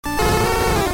vulpix cry

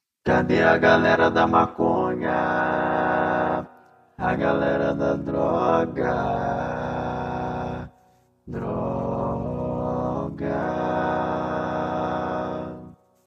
Rap

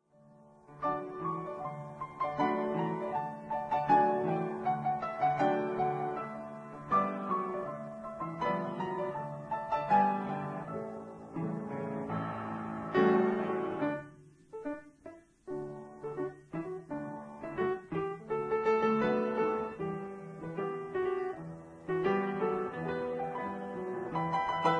Habanera improvisation on piano; bizet

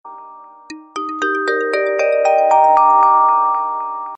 blackberry curve, sms

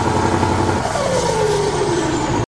Scania turbo sound

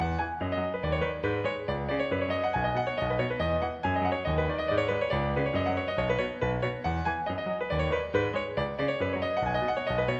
Piano

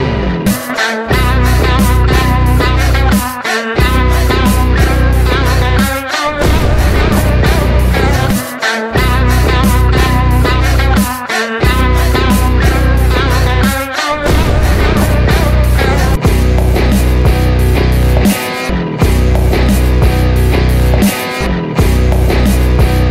Ringtone; Kabali

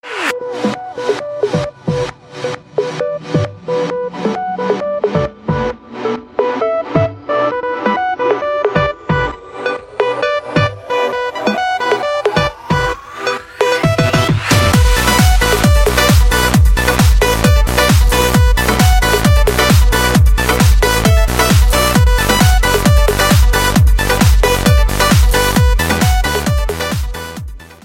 Jazz Tone

